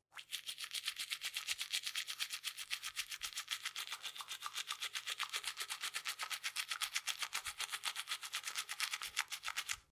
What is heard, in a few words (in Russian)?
zvuk zubnoj shhetki